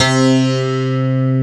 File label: PIA OLD, PIANOS